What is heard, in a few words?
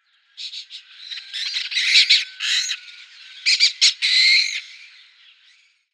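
increasing volume chirping of a kestrel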